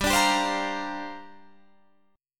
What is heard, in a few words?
C 7th Suspended 2nd Suspended 4th, G